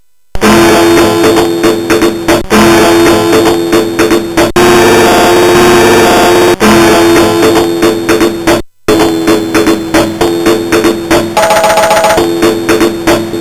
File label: Short Thrill, MOS6581R3; hvsc